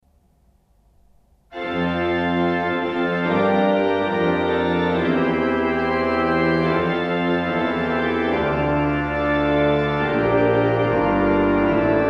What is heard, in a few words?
Fanfare; Fremført på orgelet i Tjølling Kirke; Stockmann Orgelbau Tjølling Kirke; ZOOM H4n